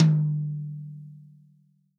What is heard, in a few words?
TOM, Real Drum Kit, POP KIT